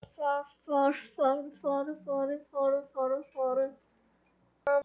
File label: purr